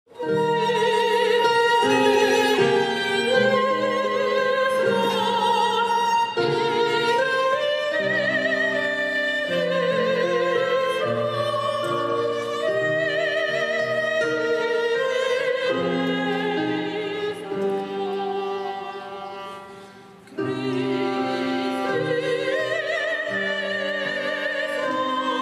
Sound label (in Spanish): Kyrie, Voz; G.Rossini